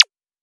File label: zap; Loupedeck Sound Pack